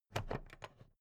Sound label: plastic clasp open regular